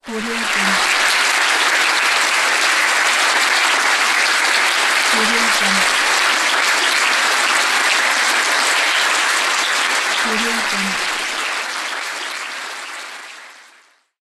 applause

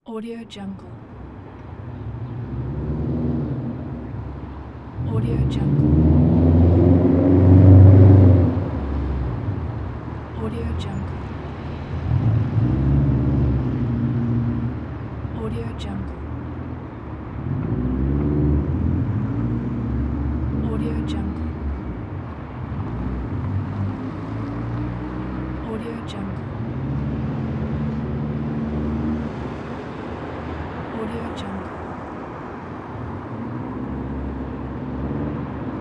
car passing by city

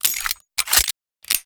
gun reloading designed; soundmorph

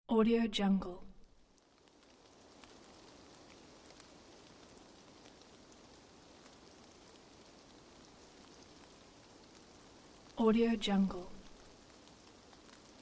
light rain

